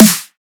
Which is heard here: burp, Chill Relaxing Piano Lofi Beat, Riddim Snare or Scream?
Riddim Snare